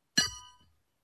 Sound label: Horse hoof bang